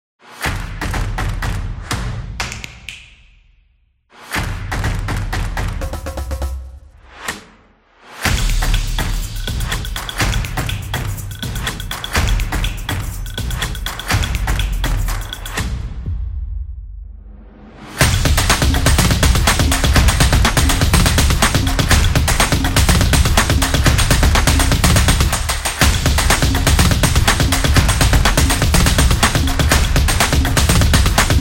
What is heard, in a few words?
Action Clap and Drum